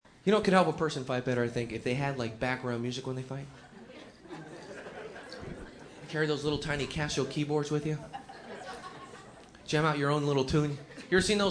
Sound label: background music; pablo fransisco